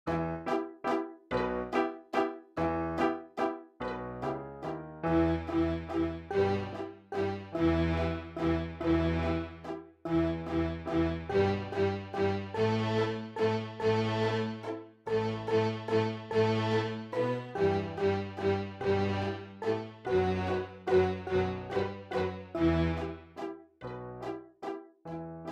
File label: Waltz; Grumpy Bear